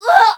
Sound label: Death